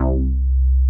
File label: JX BEEF C3, BEEFY BS; Drums In Your FACE!